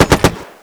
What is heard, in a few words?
m3 grease gun